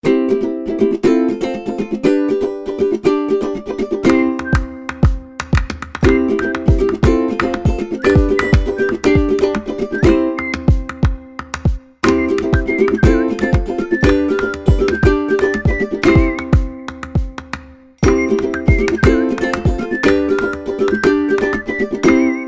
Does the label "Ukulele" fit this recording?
Yes